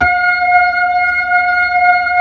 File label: B-3, Blues